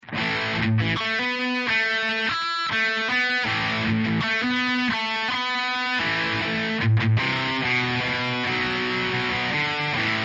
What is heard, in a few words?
Double verb + Tube drive